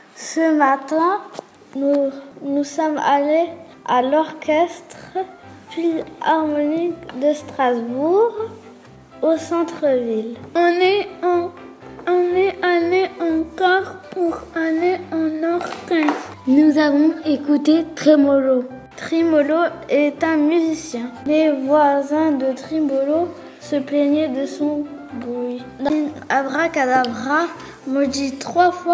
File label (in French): orchestre